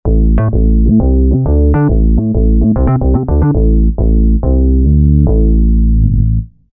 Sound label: Bass Of Ace, Roland V-Synth; Factory Patches